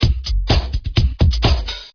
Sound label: funk, drums